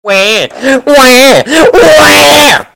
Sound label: WAAAAh louder; ByVortexx; torchlight